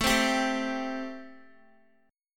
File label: A Minor 7th A, Guitar; Am7; strummed; chordshape; x,12,12,12,9,x